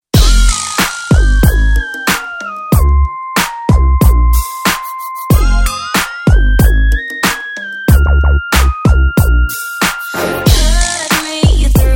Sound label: G Funk Mix